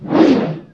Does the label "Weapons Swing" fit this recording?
Yes